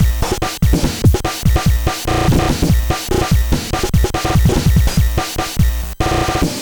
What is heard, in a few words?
NES Drum IDM Loop, 8-Bit; Nintendo Entertainment System; Drums; Chiptune; Sonic Foundry (Sony Creative Software)